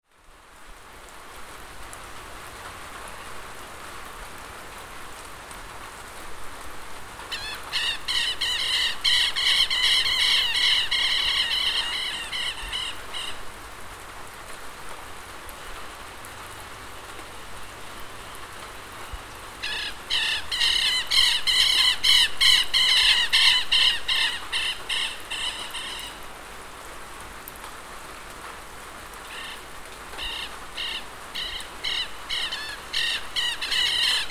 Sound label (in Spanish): LLUVIA Y QUELTEHUES ISLA DE MAIPO; paisajes sonoros